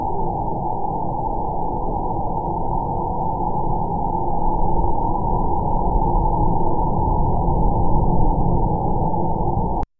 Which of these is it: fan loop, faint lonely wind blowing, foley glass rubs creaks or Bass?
faint lonely wind blowing